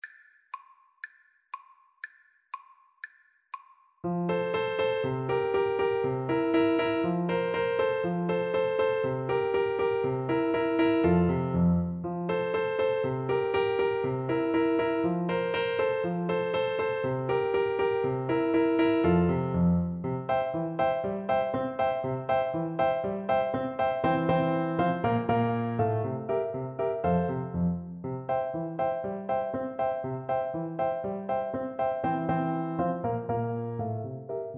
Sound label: recorder